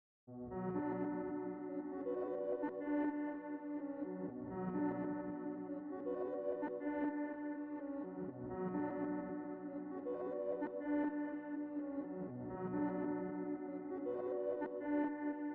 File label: 120 BPM B Min Piano Delay Chorus; Melody; Stems; Cymatics; 2022 Melody Collection (BETA); Phantom